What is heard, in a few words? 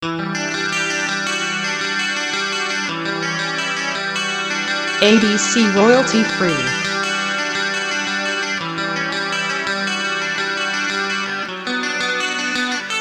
Rainy Day